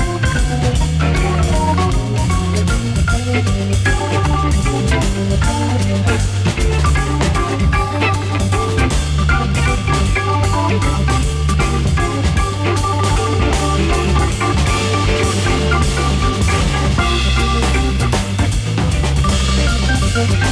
jazz

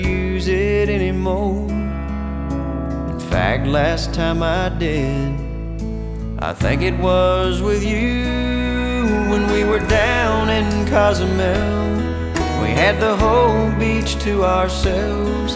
country

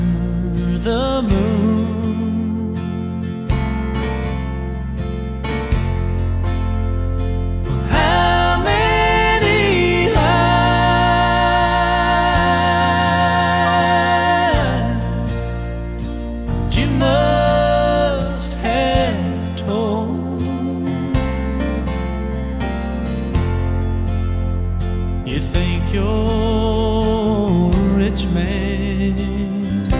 country

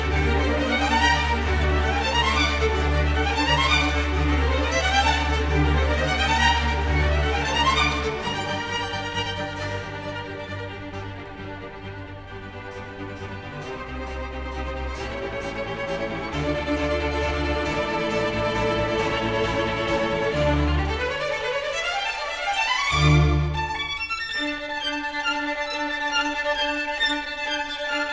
classical

